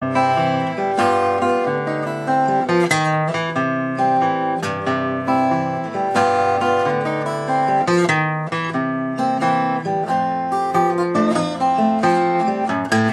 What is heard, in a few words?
ragtime blues